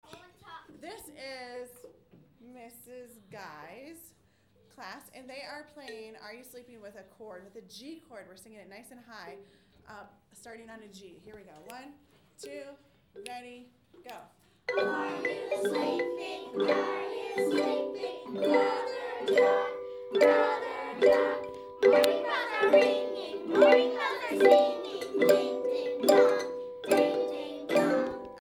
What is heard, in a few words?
guy are you sleeping g chord